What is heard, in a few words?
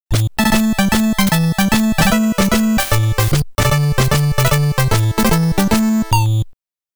Stage Clear